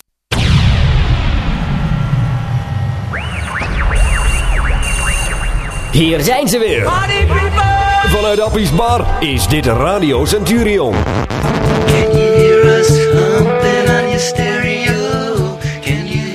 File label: Jingle; Centurion